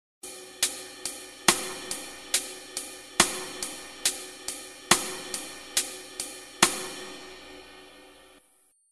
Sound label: beats